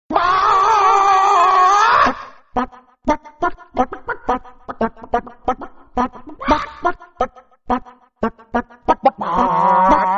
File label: crazy chicken; Pets And Animals Ringtones